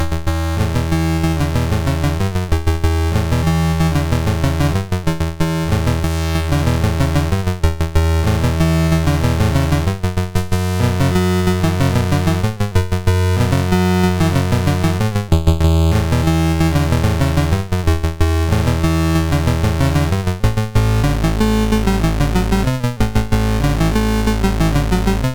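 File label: Magicians Ball, MOS 6581 SID chip music, games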